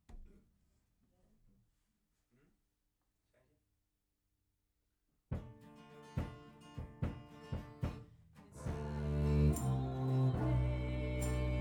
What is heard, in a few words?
Bass Mic